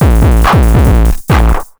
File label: PW 909 140, Hard & Loud Techno; USB Soundscan vol.01; AKAI